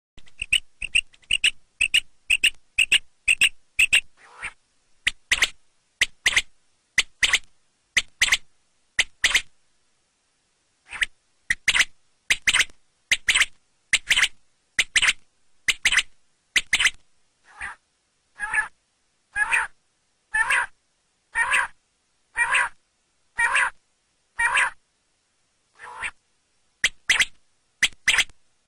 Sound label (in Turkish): Bildircin Sesi